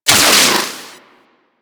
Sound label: Split Laser Beams Join; Warframe; Corpus